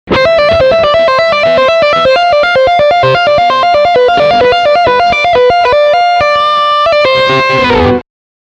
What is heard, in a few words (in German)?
Gitarre lernen Legato